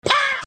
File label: waaah